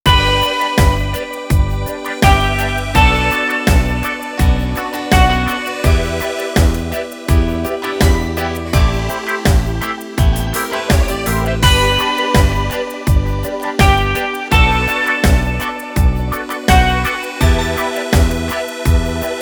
Reggae

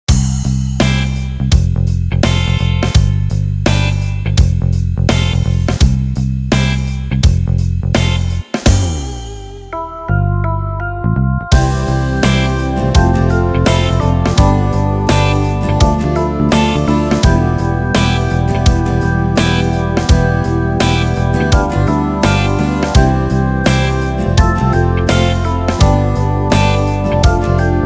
Pop

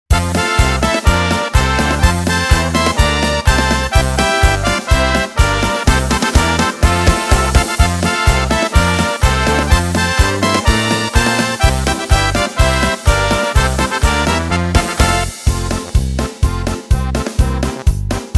Slovak Polka

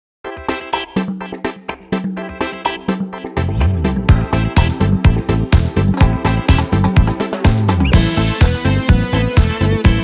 Disco

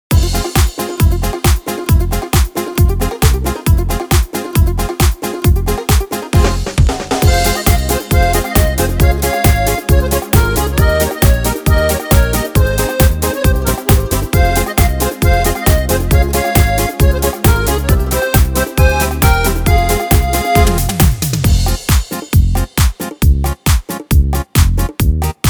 Pop Dance

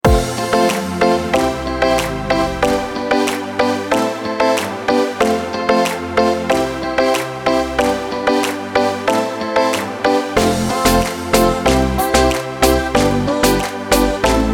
Reggae

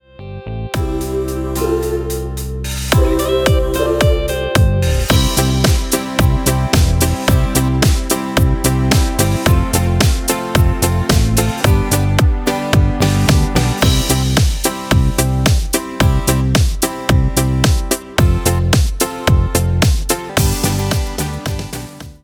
Classic Beat

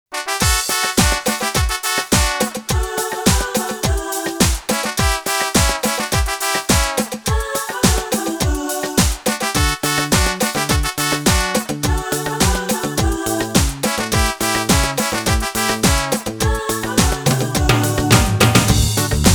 Disco

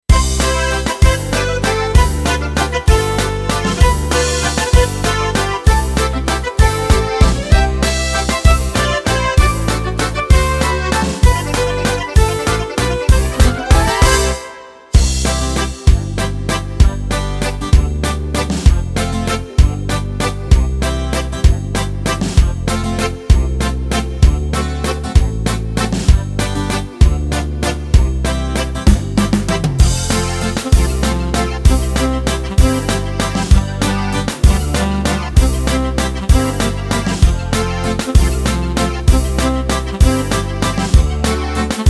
Folk Waltz

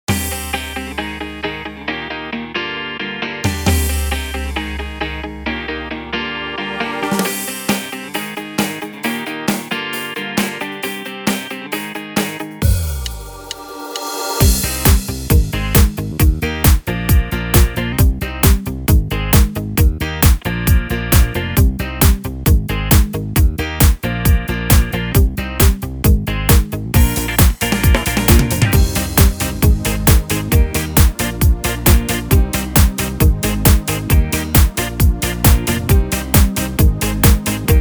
Bongo Disco

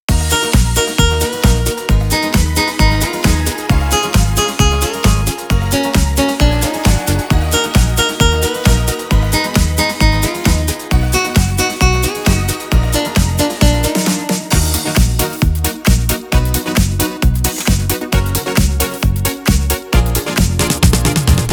Disco

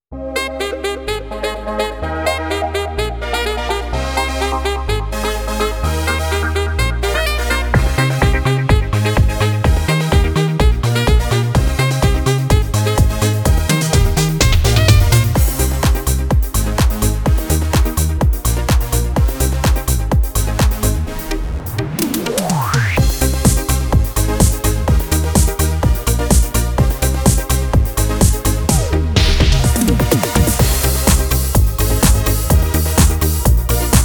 Sax & Dance

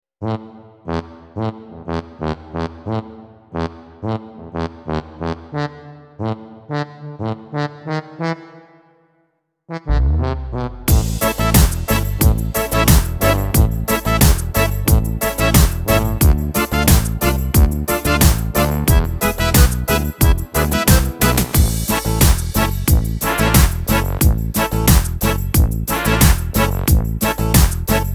Beat

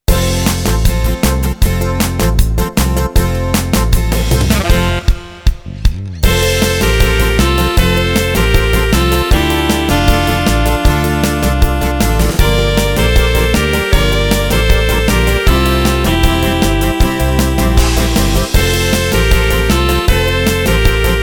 Folk Twist

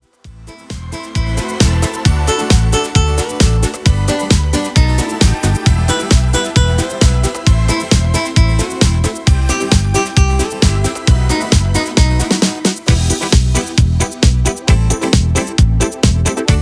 Disco

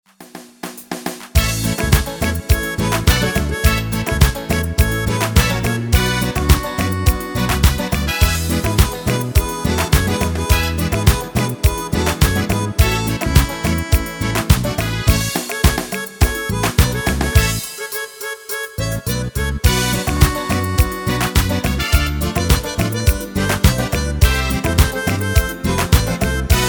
Korg GM

